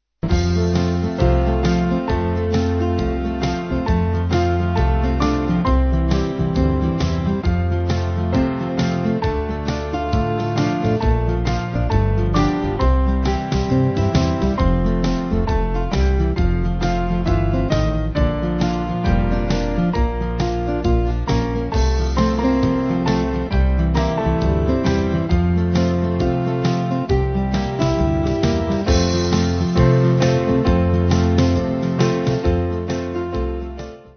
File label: Band